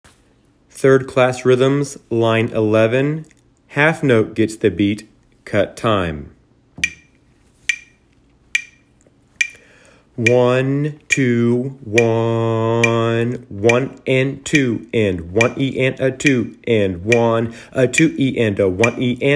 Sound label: rhythms